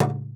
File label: LOG, INDIAN PERC; Big Fish Audio - Didgeridoo and Other Primitive Instruments